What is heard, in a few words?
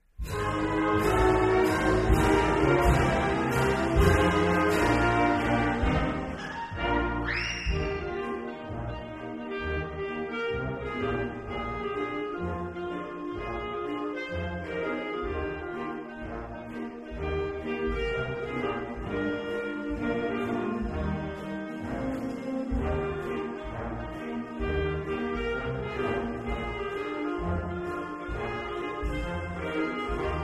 Sax; St Botolphs